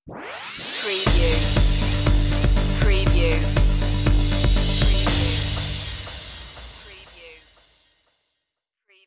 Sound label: uplifting light stinger